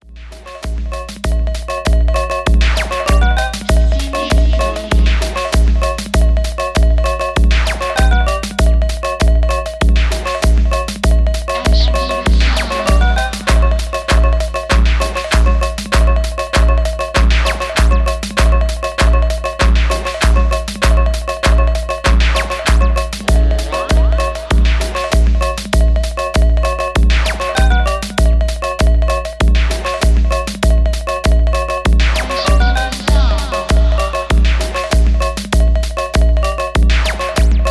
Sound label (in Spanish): Ritmo Siniestro Embrujado